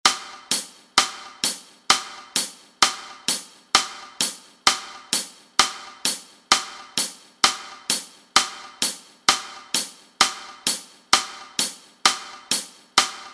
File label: band click track; ramblin wreck